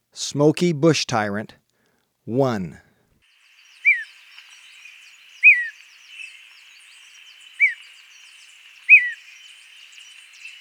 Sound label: Smoky Bush-Tyrant, Birds of Ecuador; S. America